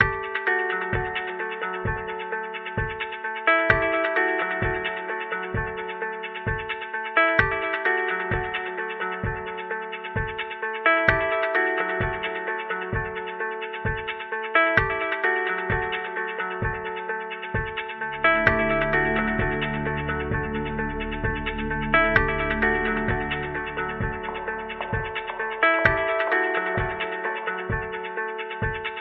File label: ideal morning iphone ringtone; apple iphone 10